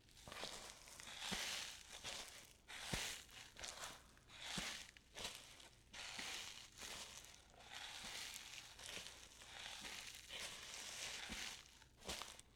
Sand Boots Walking, footsteps; Todd Blankenship

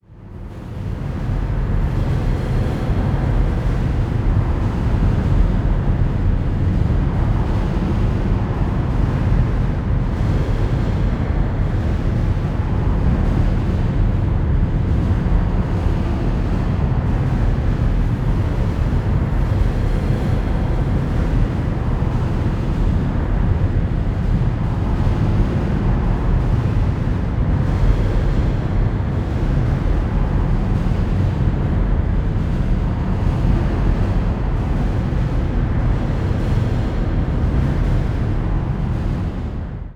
background machine room ambience; Bluezone Corporation

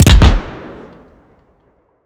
explosion mortar distant urban; Bluezone Corporation; Steampunk

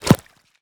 creature orc attack whoosh impact; video game; mountain dweller; Bluezone Corporation

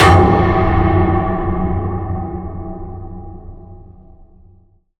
heavy metal impact large tank; Cinematic Impact; Bluezone Corporation

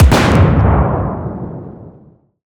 tank artillery cannon shot close; video game; Bluezone Corporation

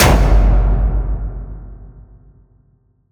cinematic metal impact; Bluezone Corporation

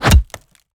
creature wood attack whoosh impact; video game; Bluezone Corporation

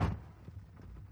car crush, car; simpra

